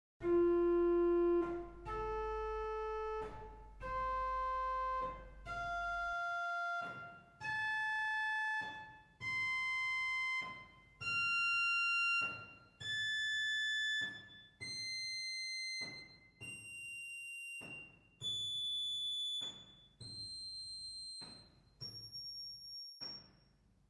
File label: Tertia arp, organ stops; Reinhardtsgrimma